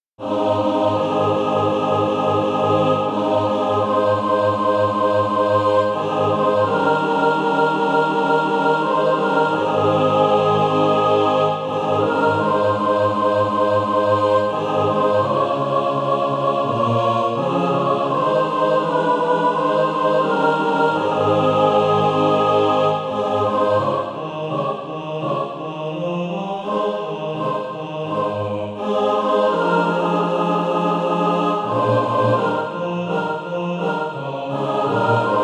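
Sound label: mixed, SATB Practise Music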